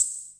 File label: hho, Roland TR-66